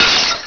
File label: Glass Short; Diamonds 3D DEMO